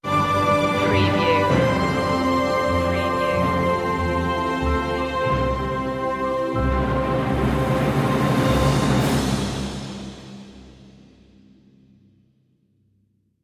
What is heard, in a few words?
orchestral stinger; the first noel; media music now